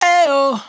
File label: riff aye oh Bbm, Dry Vocals; Raw Vocals; Slate Digital Omnivox Vocal Sample Pack